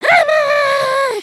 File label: dice roll; emotes